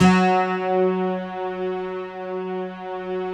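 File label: bright guitar strings; Optical Media International - Sonic Images Library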